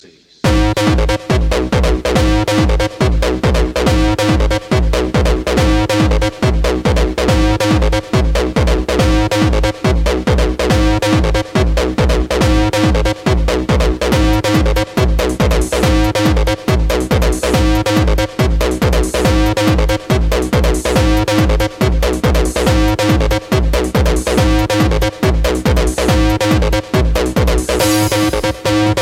Phat Bass

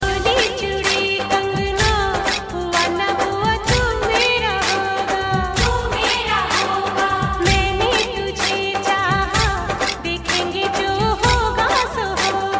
Special Tone

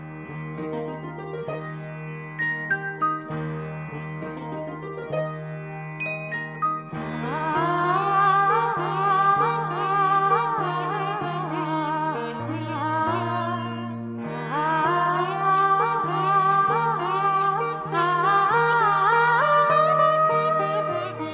tamil instrumental

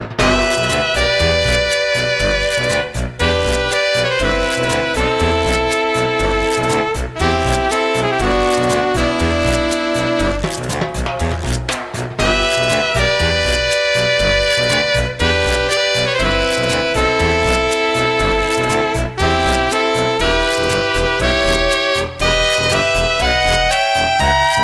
House

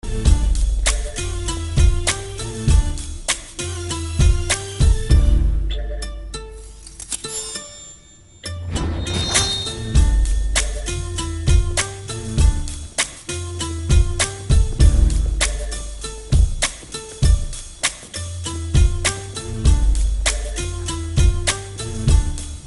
Full Bass With Knife

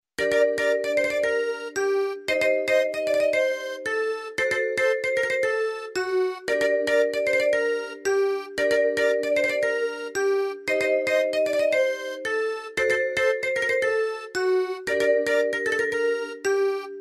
Simple Melody

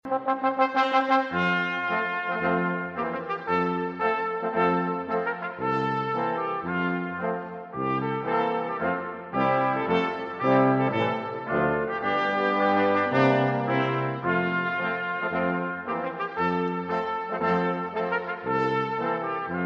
High School March

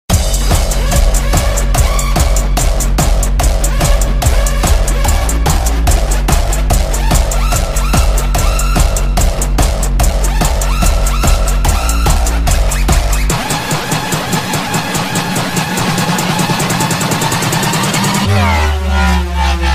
Lazy Shuffle

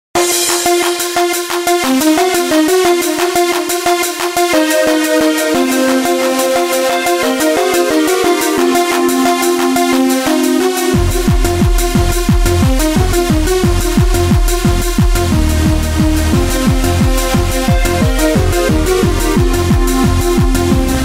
Techno Dance; FF VII

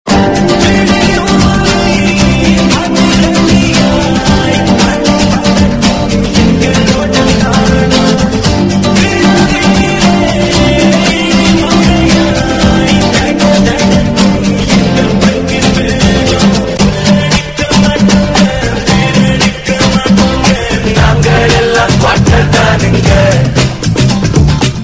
Acoustic Melody

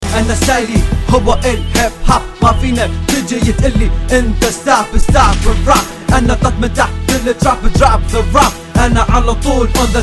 Arab

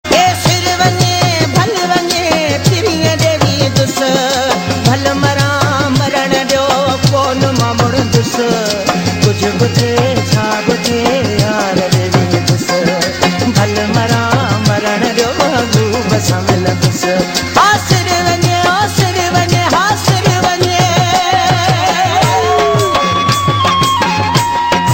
New Sindhi

